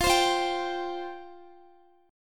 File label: F Suspended 2nd F Guitar; Fsus2; strummed; x,x,17,17,17,15